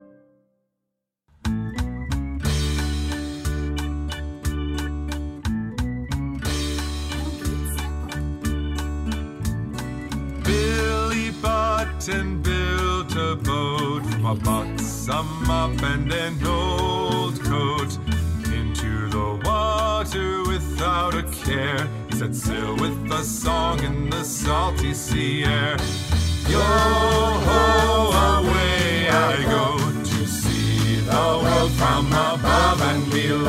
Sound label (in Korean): 영어남, 교재, 노래; 남자